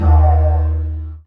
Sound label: Didgeridoo Kit Samples